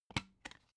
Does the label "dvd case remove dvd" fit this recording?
Yes